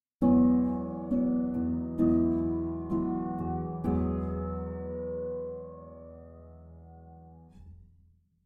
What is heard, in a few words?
Piano flanger; 镶边效果器与合唱类似，但有着更具金属质感的扫频效果